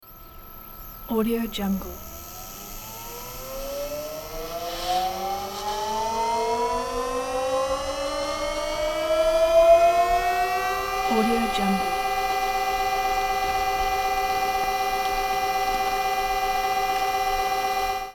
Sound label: high speed propellor or motor